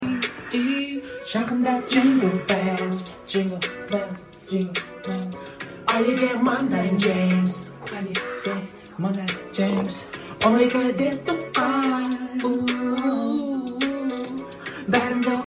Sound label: djingl bels